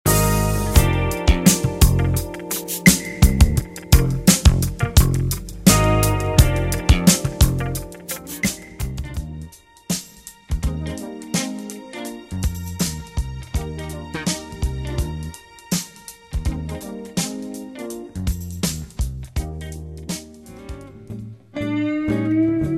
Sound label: Jazz